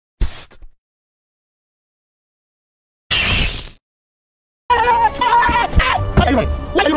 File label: screech